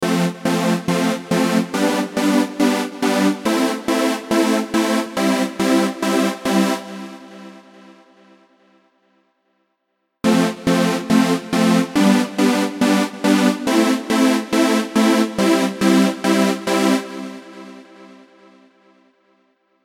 saws

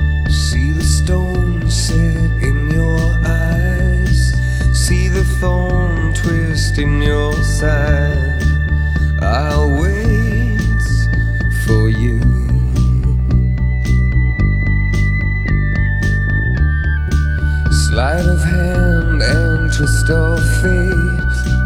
samples -12db declipped short; professional audio de-clipper; myaudiofactory

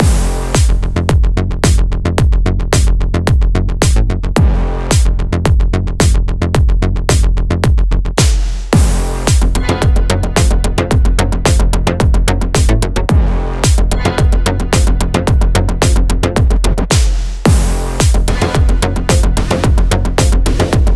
cinematic cyberwave; Noizefield Instruments; cyber suns

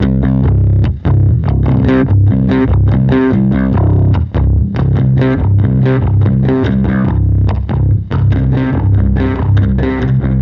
bass guitar; septimbral

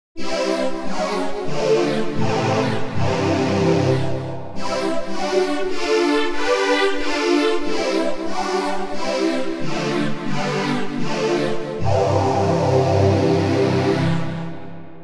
pad; the space soundset; iridium

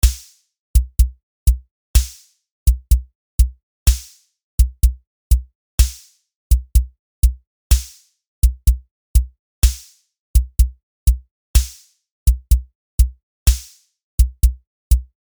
habanera; tiny pops; superwave

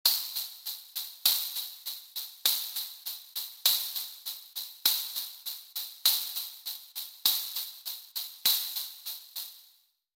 tambourine shimmer

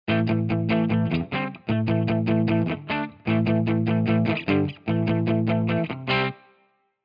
les paul wet; chiqui audio

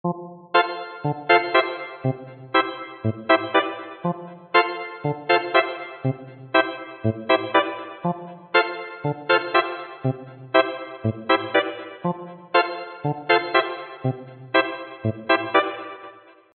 lead; fire by music sound software

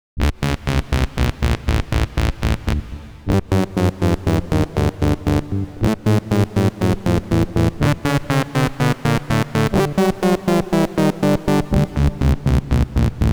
mooogy filter seq; the digital life

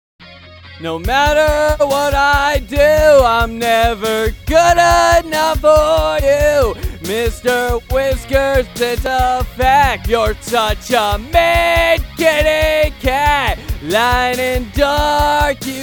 vocal raw; vocalforge; whitepeak creative

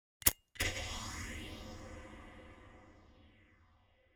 lighter delayed phazer reverb; colour delay by HOFA Plugins

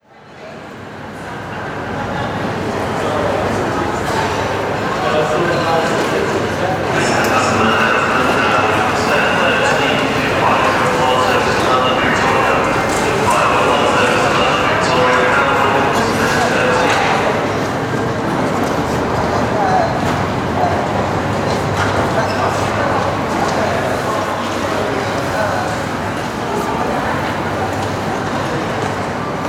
air; echotopia; soundfellas creative audio studios

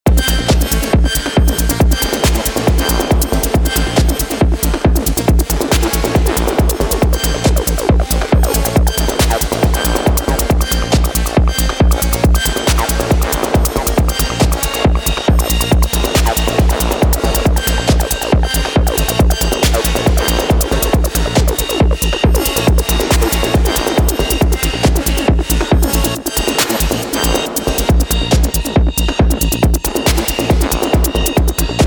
synister schranz acid tec, techno; synister schranz acid techno pack 3; edmsoundware

